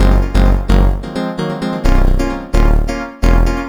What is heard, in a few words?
slick 80s film track bpm130, synthesizer; Domus Music Machine